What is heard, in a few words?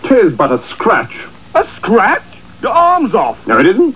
scratch; Monty Pythons